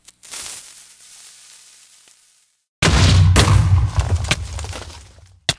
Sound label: tnt simulation